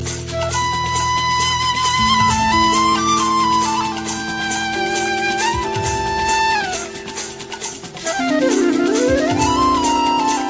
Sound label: Love Flute